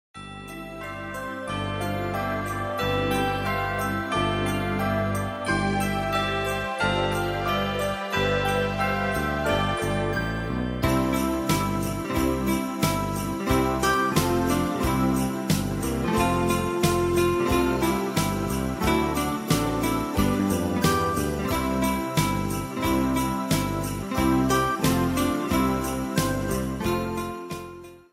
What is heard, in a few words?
JingleBells